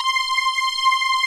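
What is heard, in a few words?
synth strings, JV 1080; Pro-Rec - Synthsonic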